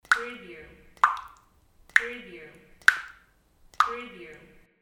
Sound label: faucet leaking